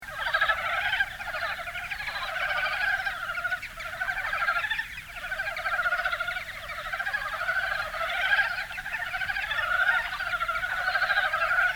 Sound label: Rana Uenoi Is Calling